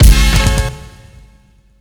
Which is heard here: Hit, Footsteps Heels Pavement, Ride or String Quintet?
Hit